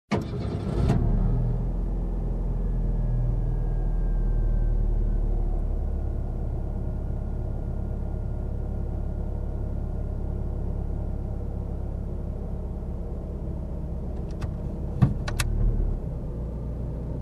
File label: Car short drive